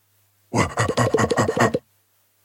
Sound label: Gorilla